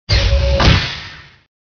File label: slide